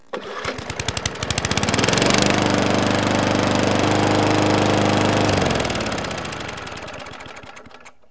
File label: lawn, backyard; KABOOM! - Wild and Wacky Sound Software; house